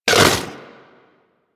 Vocal Tranquillized Grunt F; Warframe; Hunting Critters; Vulpaphyla

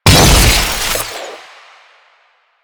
Explosive Impact, Weapons; Warframe; Acceltra Prime

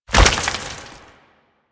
Crystal Growths Detach; Warframe; Citrine

